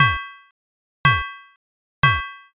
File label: blacksmith